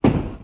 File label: wood drop